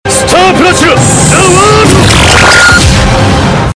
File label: bass busted; star platinum za warudo